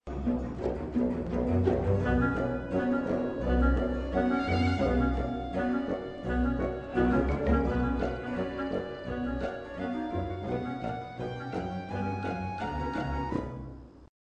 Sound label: ghost theme music; sims 3